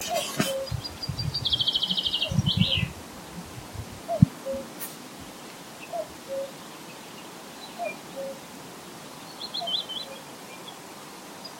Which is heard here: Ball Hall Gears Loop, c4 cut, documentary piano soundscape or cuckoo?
cuckoo